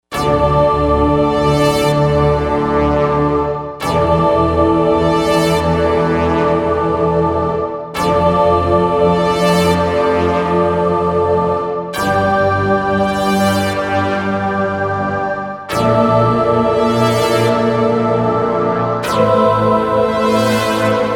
Brass Choir; Digital Synthesizer

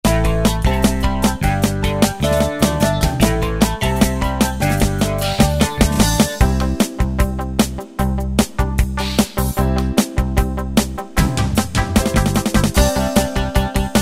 New Wave Rock, Yamaha QY70; Preset Styles

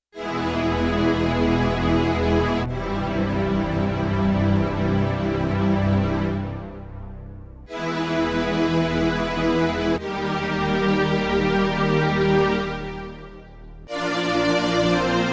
Analog Power Stryngs; Yamaha TX16W

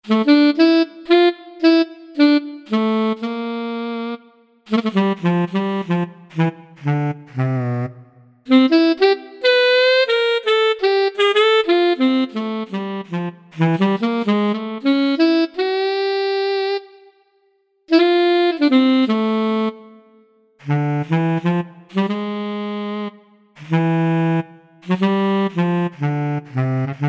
Alto Saxo

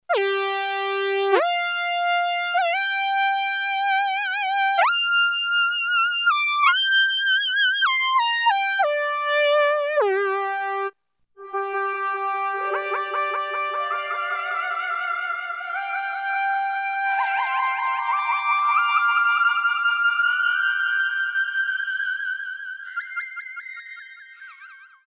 PANNING DLY+REV, Boss SX-700; Factory Preset

